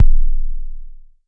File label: Jomox MBase, drum machine; Jomox MBase 01